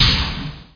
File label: Shield